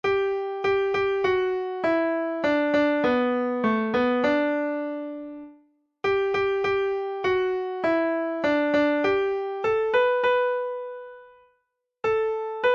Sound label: HNDHORN